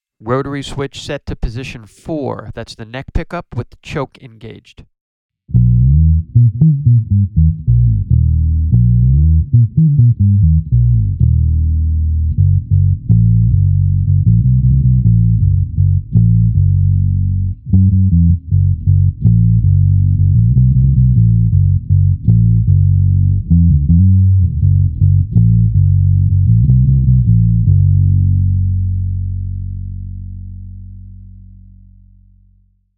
EB POS4 NECK CHOKE

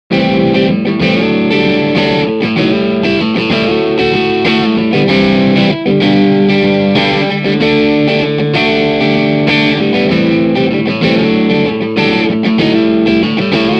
Jangly Series with Bass Cut

